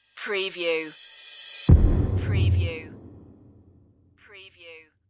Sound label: next in line rise and hit